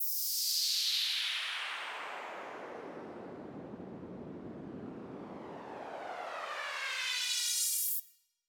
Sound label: Uplifter; FX; 99Sounds; Monosounds